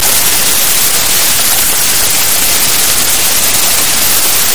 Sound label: High Sound Rain